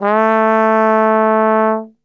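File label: A 2 trombone glissando, Brass Super Section; Best Service; TRMB GLISS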